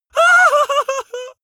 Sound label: male really afraid whimper; vocals; comedy-cartoon; soundpool